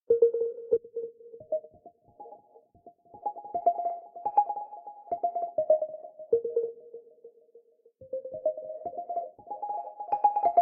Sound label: Autumn Bumpy Synth; Proteus